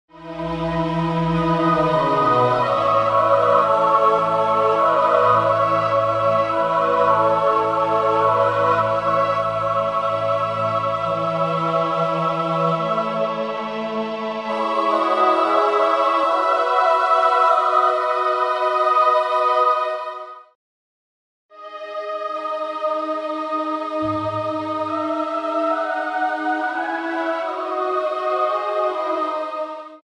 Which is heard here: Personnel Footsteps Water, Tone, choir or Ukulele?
choir